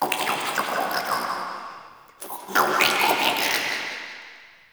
Root Cave Creature Snort; Ambience; One Offs; Neathuns Root Cave

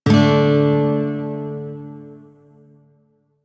Shawzin Chromatic Chord C, Music; DE; Warframe

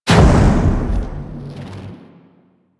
Explosion Impact; Warframe; minigames; Caliber Chicks minigame; boss

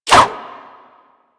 Sword And Whip Whoosh; Warframe

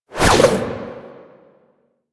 Jump Whoosh

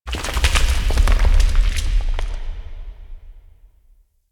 Rock Movement; Ambience; Warframe; Grineer Asteroid

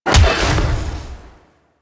Melee Jump Large Slam Impact, Whooshes; Weapons; Warframe

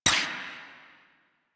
Pistol Mech; Weapons; Warframe; Drifter; Operators

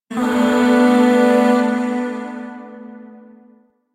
Shawzin Maj Chord L; Music; Chords; Warframe; Zariman Void Shawzin; DE

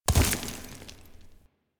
Horse Surface Kickup Dirt; Vehicles; Duviri; Steps

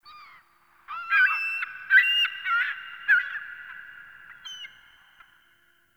Beach Bird Distant; Midrath Beach

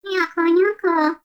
Baby Vocal Tired; Warframe; Nokko Colony

